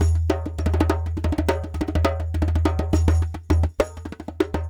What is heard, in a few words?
Lg Dumbek